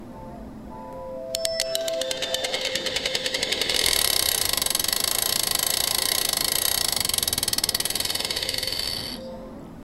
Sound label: the wheel of fortune star, game show